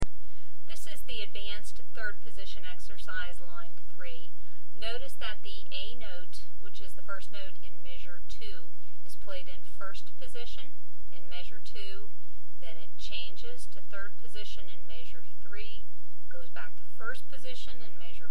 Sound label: Advanced 3rd Position Exercise, mandolin